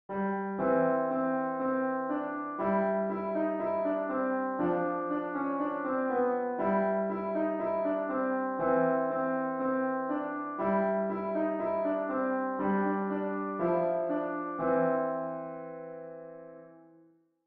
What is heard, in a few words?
piano